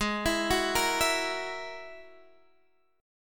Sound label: G sharp 7th Suspended 2nd Sharp 5th, Guitar, Half Step Down; G#7sus2#5